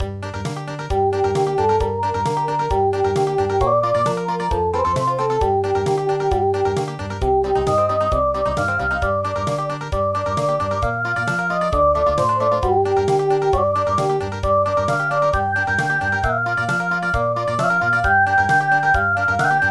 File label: Country